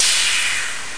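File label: door open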